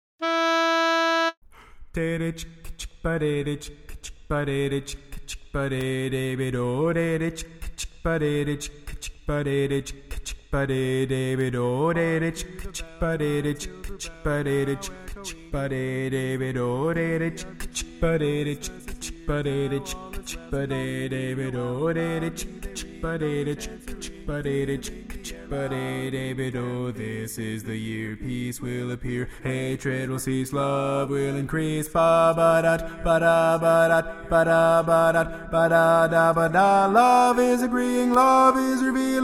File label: Carol of the Bells Bass